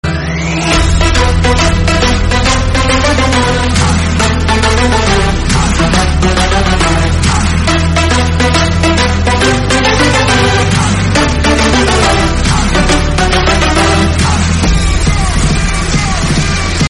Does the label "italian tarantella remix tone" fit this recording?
Yes